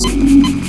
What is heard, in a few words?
revive loop